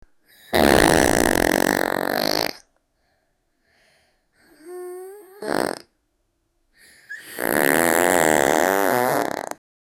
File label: a young woman farting and